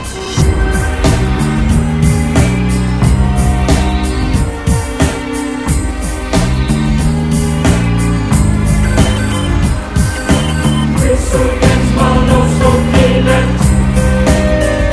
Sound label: boon beats; desert crisis half life mod soundtrack